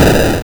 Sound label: Explosion